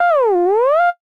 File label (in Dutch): circle zoom